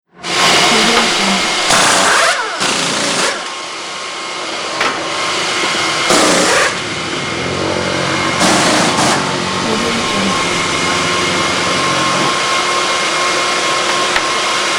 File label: fast changing wheels and cleaning racing car